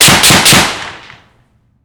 HK G3 7.62x51mm 55m in front off axis clean Triple shots; Heckler & Koch G3; Pole Position Production